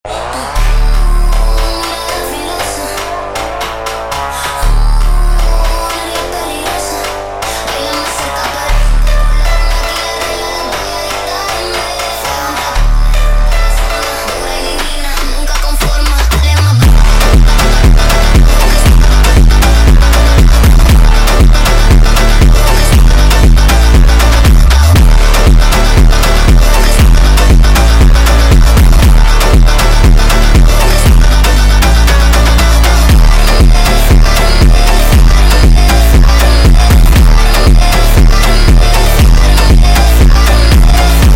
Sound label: Funk sped Up